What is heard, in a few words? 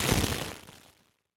debris; SoundsCrate